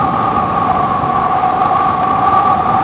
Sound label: fast, ships engine; Quantum Redshift; XBOX